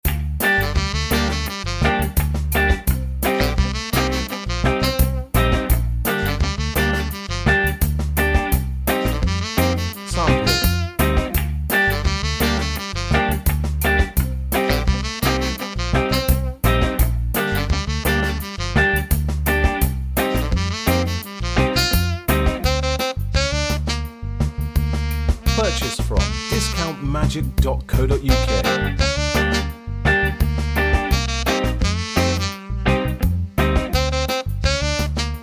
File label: sax laid back, backing